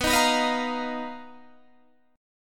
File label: B Major 7th Flat 5th B down Guitar Standard; BM7b5; strummed; JGuitar